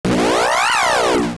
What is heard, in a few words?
Battle; Zelda; AOL